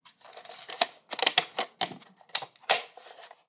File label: VHS cassette input; SoundsCrate